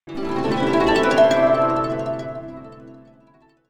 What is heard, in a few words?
pet level up